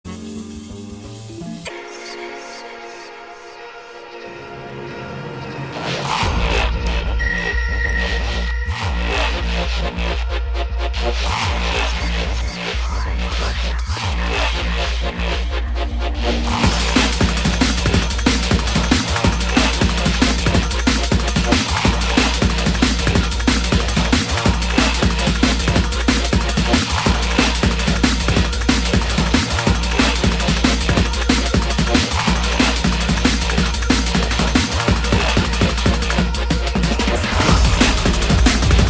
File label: Drum and Bass Jungle, UK Bass Radio